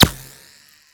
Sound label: dirt, hit; tacz-tweak-pack; taczmechanics